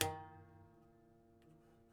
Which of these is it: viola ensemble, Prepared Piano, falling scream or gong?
Prepared Piano